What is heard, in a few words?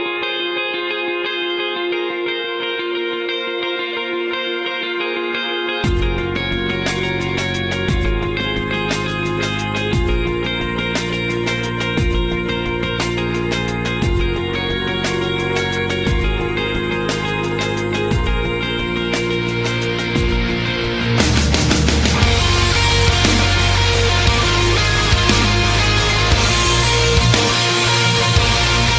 Acoustic Guitar mix